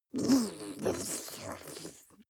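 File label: witch drool slobber, creatures; kreaturen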